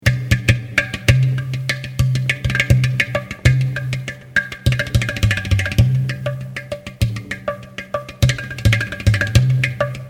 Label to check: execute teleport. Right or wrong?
Wrong